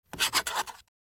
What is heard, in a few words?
Handwriting signature paper contract